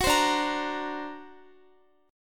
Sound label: D Augmented 7th, D, down, Guitar; strummed; 4E,4A,5D,5G,5C,6F; chord